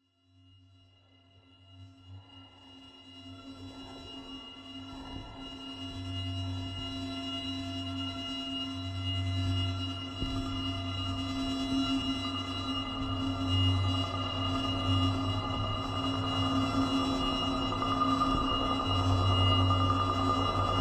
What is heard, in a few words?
Magnetic Fields